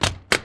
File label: see otter die